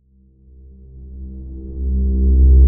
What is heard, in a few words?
Riser C; Thrill; Ghosthack